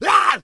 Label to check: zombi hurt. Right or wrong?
Right